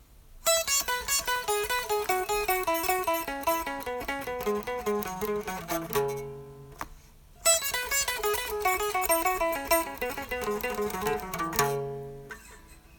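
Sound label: guitar solo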